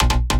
Hard Pluck Bass

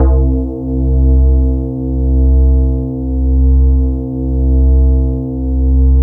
E-HARP, DX HARP; Wizoo - Powered DX

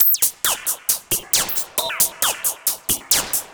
Lazer Face 135BPM, sci-fi Beats

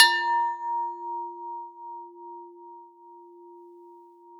TUB F 4, tubular bell; SoundBytes - Early Patches

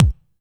HOUSE DRUM BD; AKAI S6000 CD-ROM - Volume 1; DRUM RYTHM

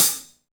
CHH, Hi Hats

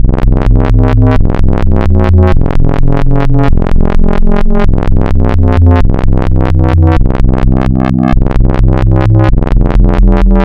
Spectral, wavetables; Architecture 32

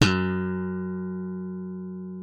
F#2, slap thumb; Xsample VOL-1

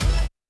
percussion, Roland TD-20

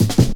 Pride Cut, Drum Loops, 165.5, Jungle

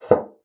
BASSOON; Xsample VOL-6

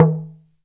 TALK DRM, Heart of Africa; Spectrasonics

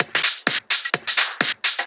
Discohouse Loop 128 BPM

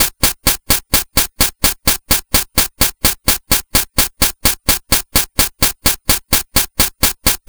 Game Boy Noise Hat Loop, 8-Bit, Drums

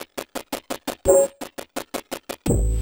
Glitch Stutter, Synchro Funk, Beats; Sonic Foundry (Sony Creative Software)

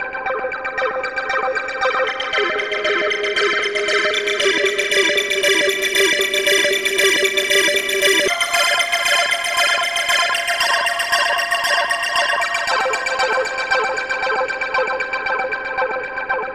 Synth Chords; Eclectic Electronica

